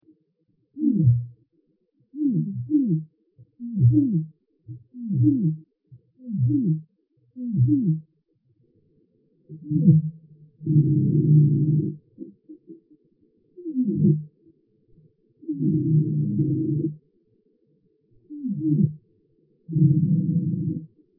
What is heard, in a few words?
tetrix tenuicornis male songs